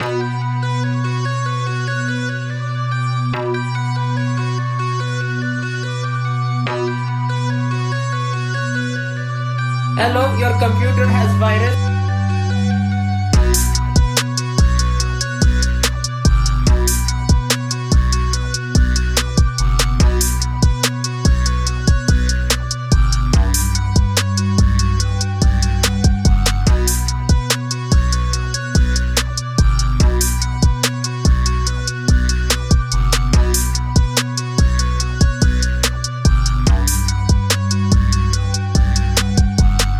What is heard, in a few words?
Ebm Trap Bm